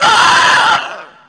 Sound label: falling; area51